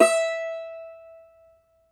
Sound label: cumbus saz E, plucked strings; E-MU Producer Series Vol. 7 – Old World Instruments